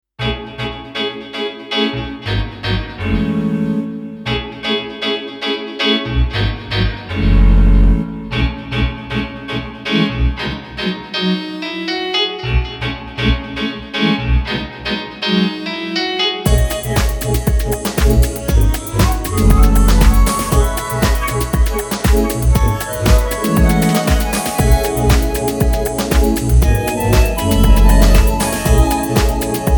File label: Flute Dreams, italo disco